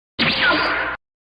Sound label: teleport